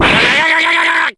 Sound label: shock